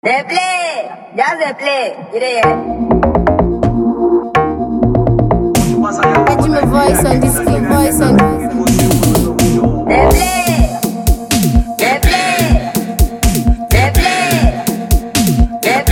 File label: Dance Beat